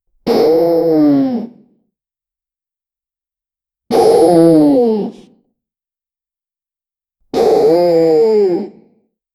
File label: funny cartoonish loud sno